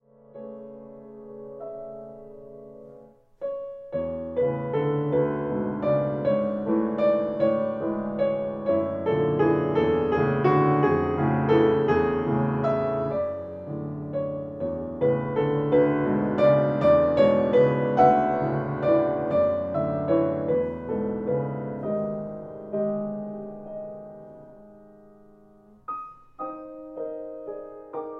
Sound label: Lullaby